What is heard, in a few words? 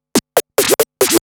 Scratch Fills 140BPM; Vengeance - Essential Clubsounds Vol.4